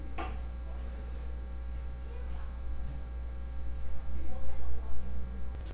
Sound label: throw